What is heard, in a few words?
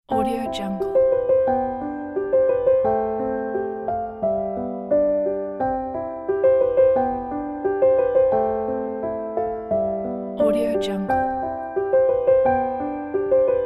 romantic valentines day